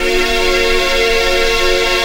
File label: Bang Pad; Jungle; Pads; Blu Mar Ten; Jungle - 1989 to 1999